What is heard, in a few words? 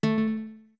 Notes Guitar A short; Zora guitar (short)